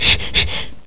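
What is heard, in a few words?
teeth brush; corel-draw-6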